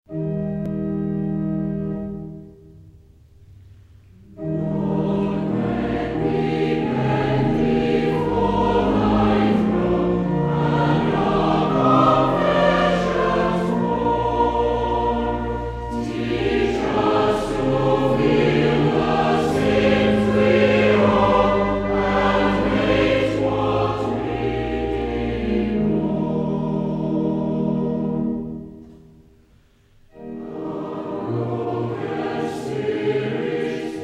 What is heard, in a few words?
Choir, hymns